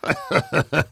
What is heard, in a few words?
laugh evil; Heavy